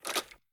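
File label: gear rattle weapon large; viper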